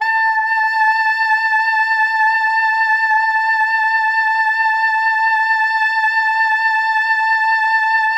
Pop Brass A4 L; Best Service ProSamples vol.19; AKAI

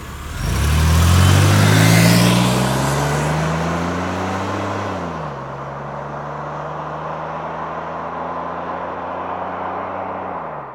DRIVE AWAY, Car; VSI Car

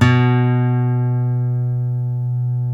STL NV B 2D, FOLK STEEL Guitars; Spectrasonics; Hans Zimmer Guitars VOL-1

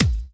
BD; Best Service; Dance Mega Drums

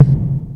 Moog BD; Moog Modular55

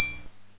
DM5 Perc, Alesis DM5 drum machine

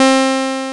SAW WAV HI

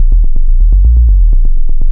Bass Line; Eclectic Electronica

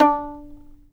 fiddle pizz D4; Sampleheads; Whole Lotta Country 1 & 2

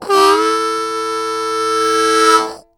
Harm 098bpm A end, Harmonica; Quazibeat - African Secret

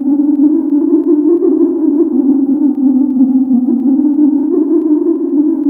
EERIE, MISC VCS; Zero-G Ambient 1

